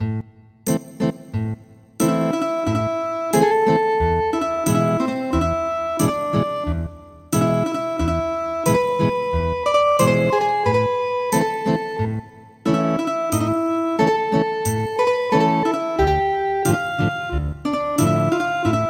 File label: Ethnic Guitar, midi traditional; Wishing you a Merry Christmas